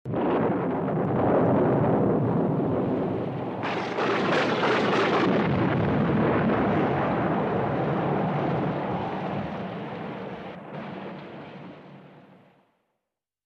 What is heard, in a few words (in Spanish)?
super tormenta